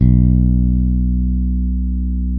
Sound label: bass, sustain; Sampleheads; Will Lee Bass Library